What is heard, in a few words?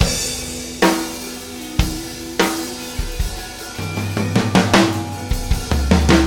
loop